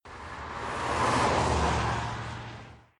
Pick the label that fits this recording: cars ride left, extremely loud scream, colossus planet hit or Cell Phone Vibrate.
cars ride left